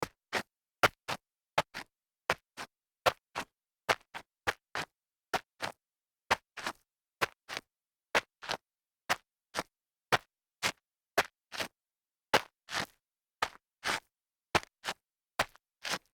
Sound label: sneaker toe slides light grit